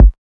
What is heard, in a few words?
kick G, hip-hop drum kit; 99Sounds x Monosounds; Moon